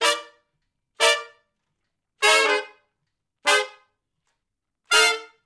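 Shuffle Blues Riff [V, IV, I] (F) harmony, Horncraft for R&B; Sections; Crimson Blue and Fabulous Horncraft 4 RnB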